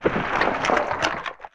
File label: debris; Worms3D